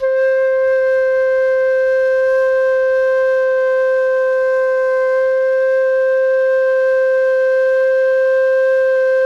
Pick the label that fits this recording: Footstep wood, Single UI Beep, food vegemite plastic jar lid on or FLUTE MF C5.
FLUTE MF C5